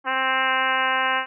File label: trumpet